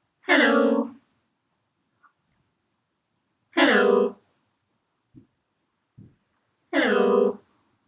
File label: pitch layering audition